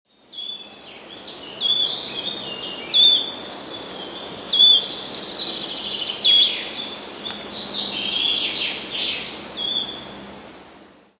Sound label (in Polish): swistunka, nawoływania świstunki leśnej; wroblowe; zapozyczenia